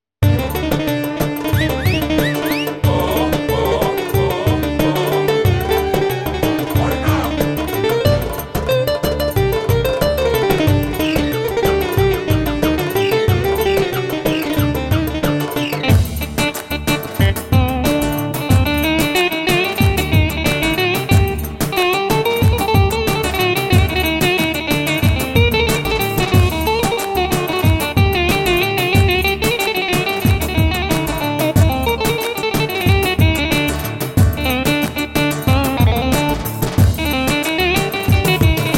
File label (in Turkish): ciftetelli